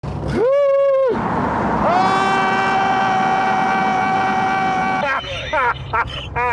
screaming; clarkson

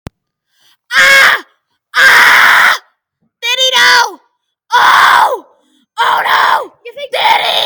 ahhhhhhhhh

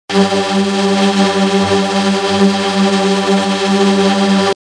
group sound effect

